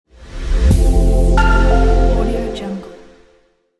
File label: minimal tech logo